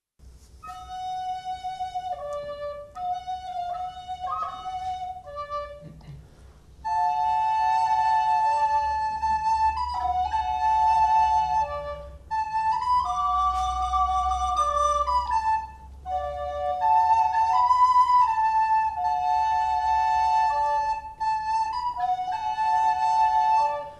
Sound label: miniblues